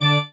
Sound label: marimba grave; ゆめ2っき